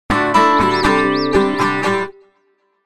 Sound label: guitar